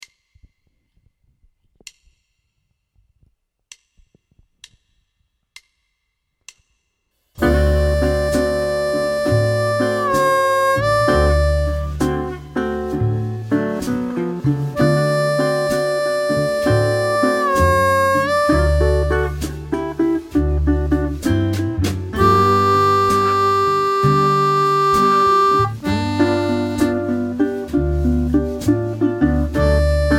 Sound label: Blues harp, Медленный пример, блюз; 4-4’-4 / -4-4’-4 / -2–1 / -4–4’-4 / -6 / +6 / -4–4’-4